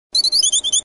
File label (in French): Cri; Passerouge